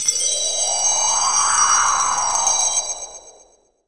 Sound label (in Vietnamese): Tieng gio thoi chuong gio keu